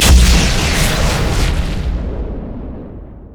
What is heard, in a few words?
chaos storm; LoL; viktor